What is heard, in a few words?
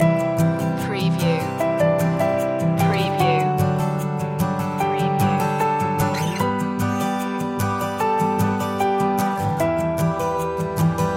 loop